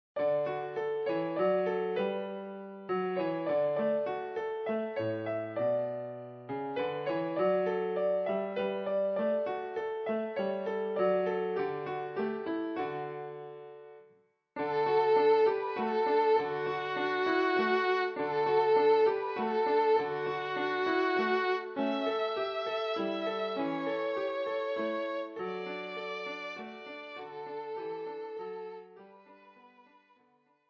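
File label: violin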